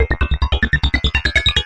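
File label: evil electro babble, 143 bpm; SYNTH AND ELECTRONIC LOOPS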